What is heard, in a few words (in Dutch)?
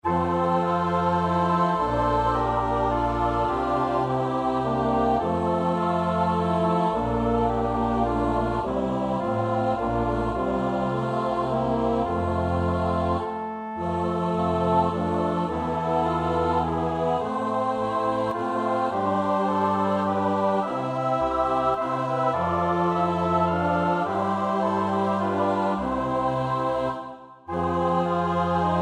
S-A-T-B, koorpartij oefening; Dubois (Théodore); Messe Brève